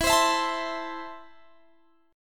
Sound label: E Suspended 4th Sharp 5th, Guitar Standard; Esus4#5 strummed; x,19,22,21,22,20